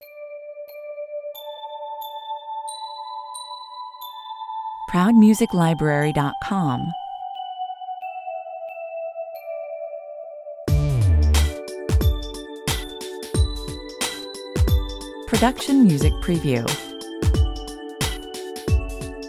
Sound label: twinkle twinkle little star (groove version)